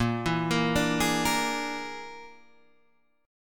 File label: Bb 7th Flat 5th Bb, Guitar Standard; Bb7b5; arpeggiated; JGuitar